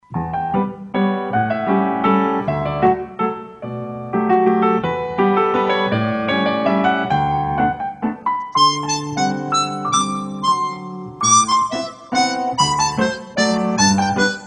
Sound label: Nokia Tune